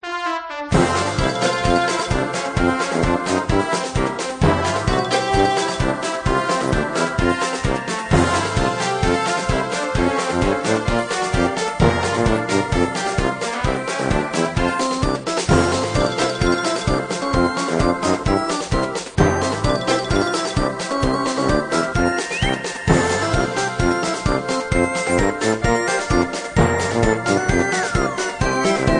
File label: Big Top Circus